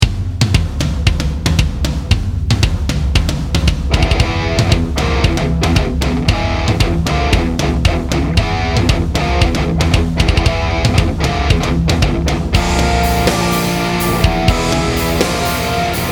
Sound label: tritone scale etude